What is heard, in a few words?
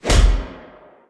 Hit; Pochi